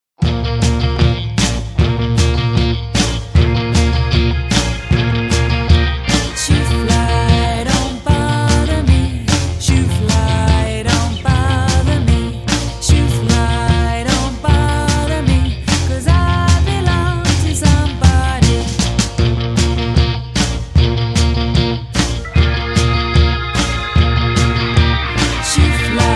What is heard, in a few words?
nursery rhyme; Shoo Fly; traditional American song about flies